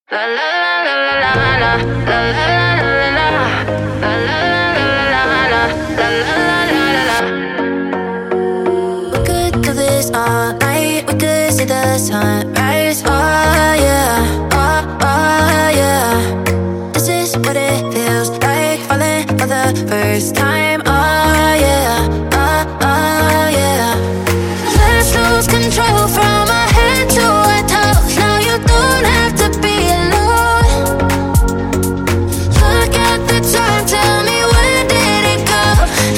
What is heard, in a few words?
Duo Melody